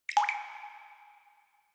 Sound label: Water Drop Low Reverb